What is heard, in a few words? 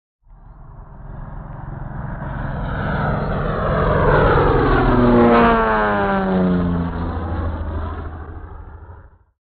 WWII - AIRCRAFT PROP FIGHTER: EXT: Fly by overhead fast speed.; ww2 fighters